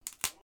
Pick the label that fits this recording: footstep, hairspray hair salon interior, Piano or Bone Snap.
Bone Snap